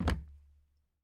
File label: plastic land; teardown; ps5 windows xbox series xs